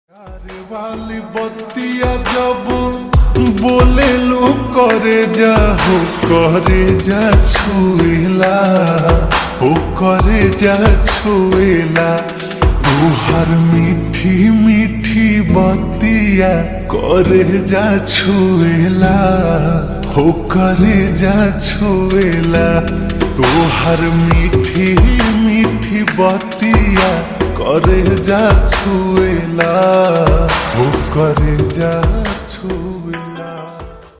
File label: Slowed Reverb Ringtone